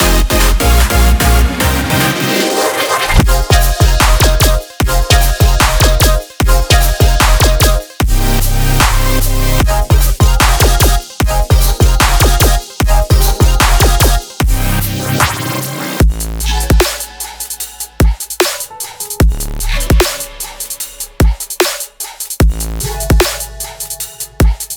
rainbow bass; xlnt; ultra; ultra audio

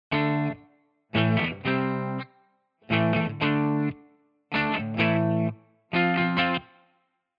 free les paul; chiqui audio

